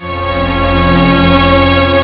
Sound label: string; ST-XX Amiga Soundtracker Sample Packs